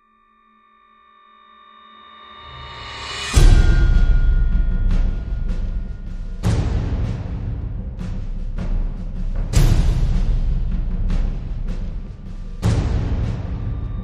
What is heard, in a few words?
Drums